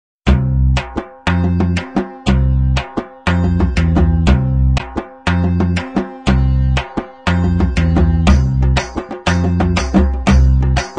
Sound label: Folk Dance